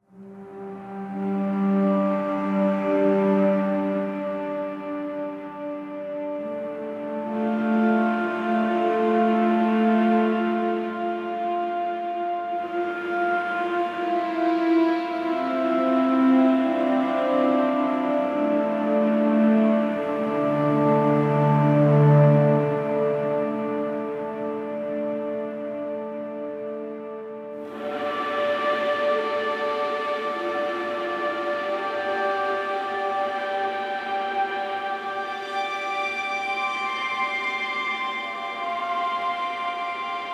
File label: String Ensemble